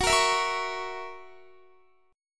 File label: Gb 7th Gb down Guitar, chord; Listen to Gb7 strummed